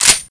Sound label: colt slide release